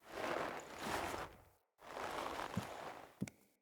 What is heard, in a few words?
Foley Idle Variant; Friendly; Orlick